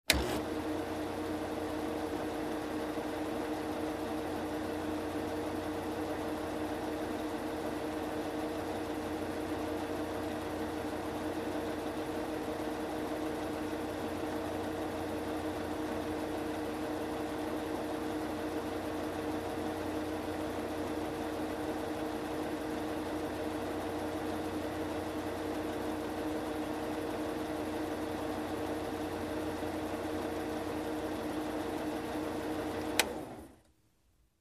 Machine Turned On

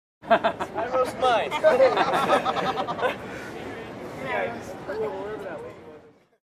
Man in public laughing

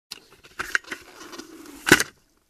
Sewing Machine Drawer close

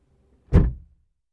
Cars

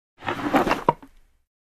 Small sliding door open

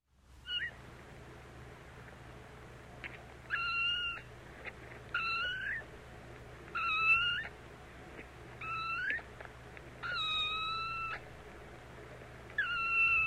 Kitten meowing squeakily

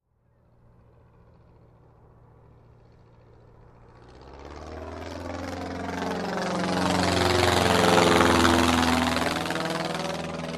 Biplane Ground Rolling and Took Off

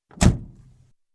Truck Door Close

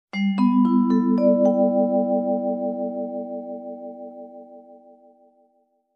Resolving vibraphone melody

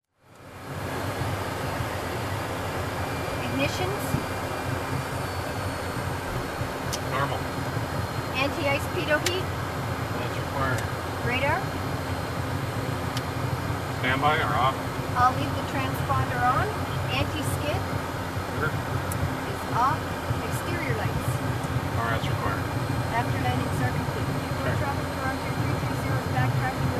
Aircraft Crew in cockpit navigating

